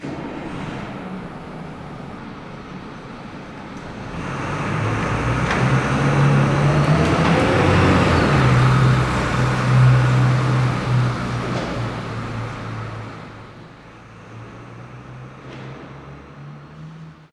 bus drives away, street traffic; best service Blue Box

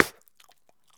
Bubblegum Pop; SFX Kit; Foley - Misc

